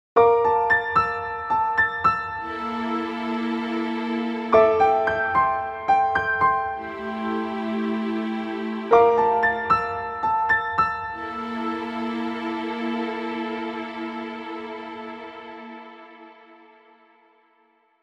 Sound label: jingle switch